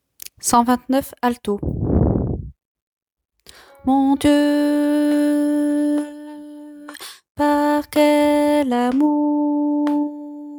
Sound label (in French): alto